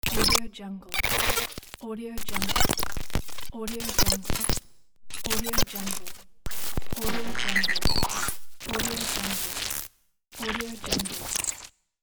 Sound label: glitch pack; bogdanbogdanov